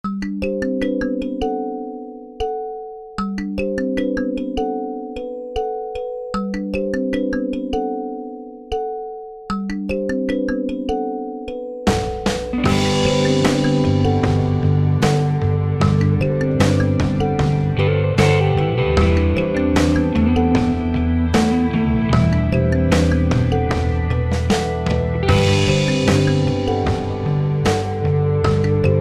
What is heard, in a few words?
Slow Rain instrumental